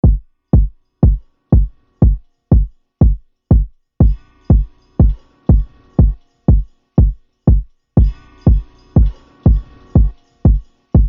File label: Two Tone Train Groove Dub